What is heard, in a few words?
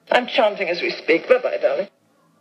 Chanting